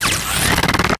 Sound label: ranged warning, headcrab reviver; creatures